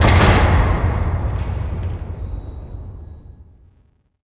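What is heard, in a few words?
door large Hangar end; Theed; Star Wars Obi-Wan; XBOX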